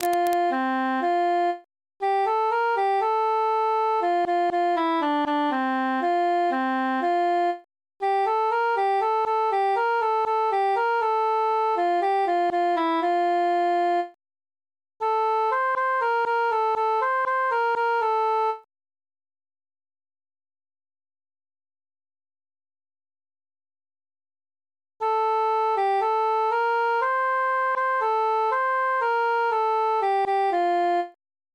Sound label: cantus solo; psallite unigenito